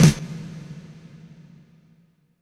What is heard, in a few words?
124 SNARE; East West - Phat & Phunky CD2; 90's Sample CDs